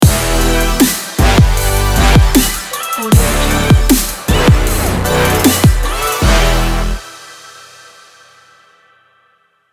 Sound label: future bass logo